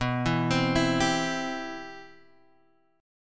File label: C Major 7th, B, guitar chord